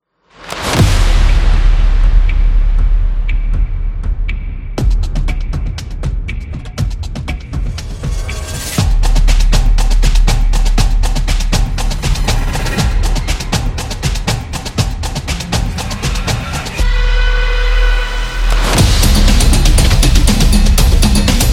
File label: Energy Sport